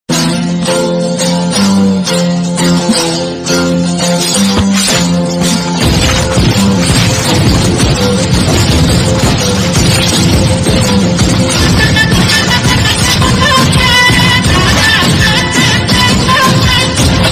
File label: climax bgm ringtone; pudhupettai